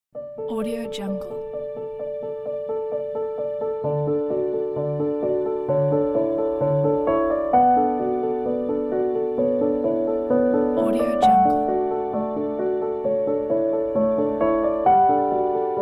romantic inspiration

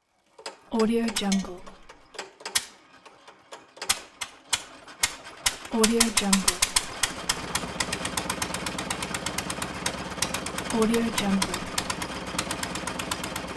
diesel wheat grinder

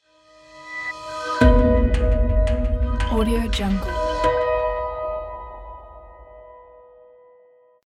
the logo; hitslab

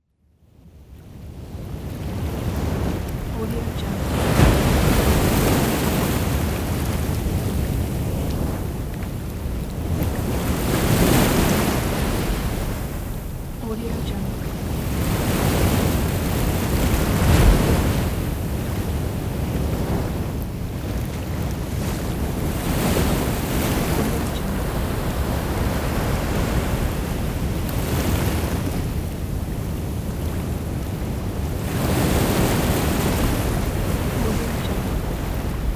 waves

